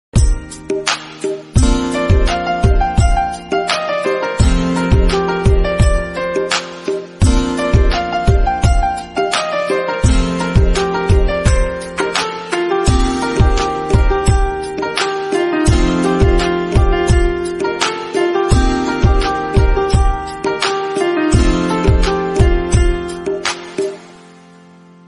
instrumental bgm ringtone; kiraadvani

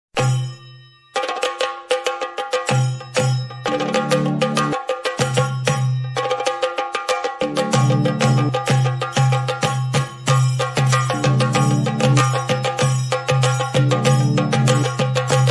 chenda melam ringtone, kerala melam

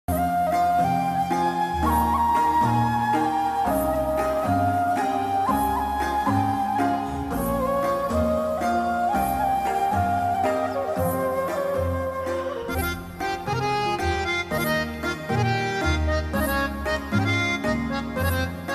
instrumental; mere humnasheen drama ringtone; mere humnasheen ost ringtone

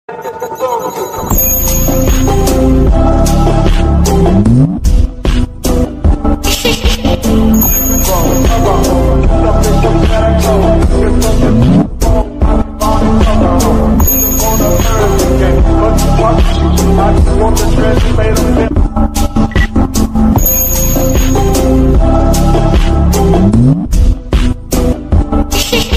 attitude ringtone bgm ringtones english ringtone; bad boy ringtone

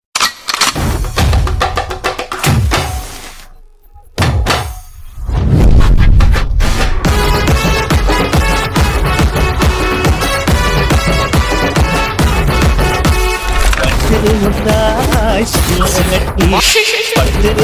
bgm ringtone; sjsurya

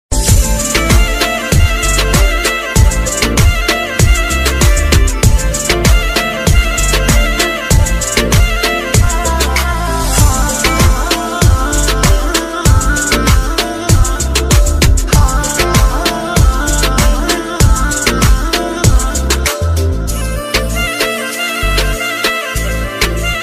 ringtone bgm ringtone

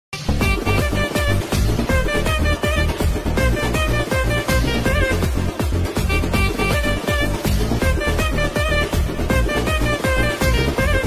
song bgm ringtone

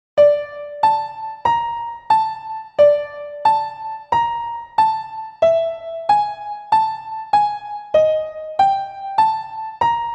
easy piano; vada chennai; rajan bgm king of sea; dhanush

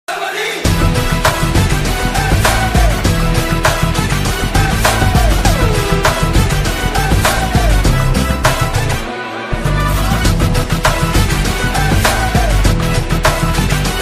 attitude bgm ringtone; master bgm ringtone; vijay thalapathy jd intro ringtone; south movie

